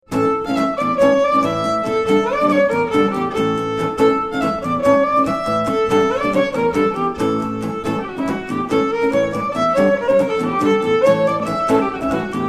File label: inst duet